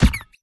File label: Hammer Hard Kick; game audio; Mita; MiSide